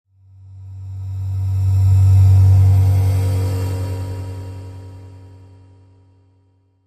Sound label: Logo Electronic Wash with Static, Reverb